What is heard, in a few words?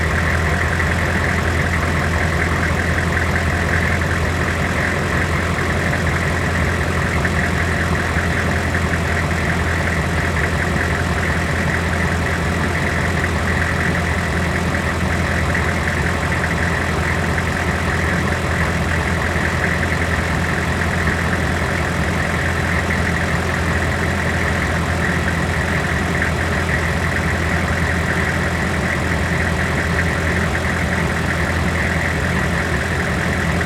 MOTOR IDLE 4X4; SIMUZENSOUND